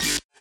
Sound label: wobble